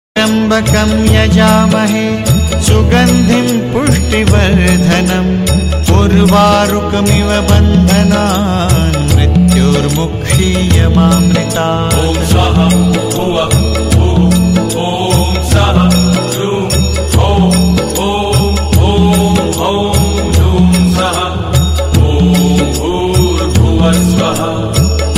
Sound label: maha mrutunja mantra